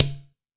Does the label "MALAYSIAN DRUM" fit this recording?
Yes